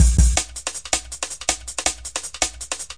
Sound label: 161 rolling, drum loops and breaks; 161 to 180 bpm